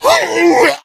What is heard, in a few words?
become enraged, infected; npc; alert